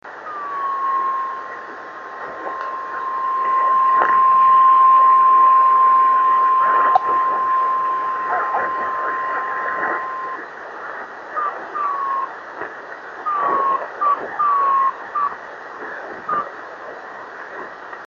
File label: ACE 26510KHz